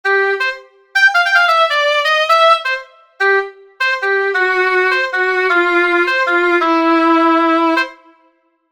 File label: Oboe n Violin